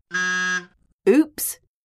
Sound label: wrong